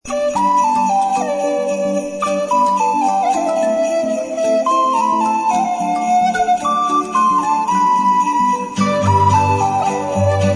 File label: Jingle Bells Strategic Music; Kick the Buddy Forever